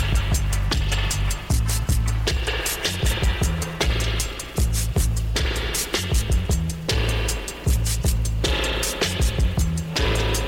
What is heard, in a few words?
Distinctive Dub